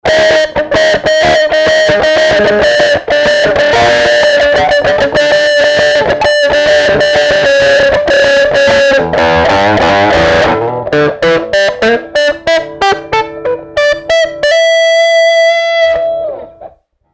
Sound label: PEDAL WAH